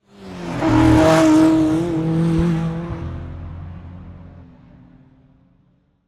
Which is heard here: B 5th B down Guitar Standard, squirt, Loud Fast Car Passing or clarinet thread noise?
Loud Fast Car Passing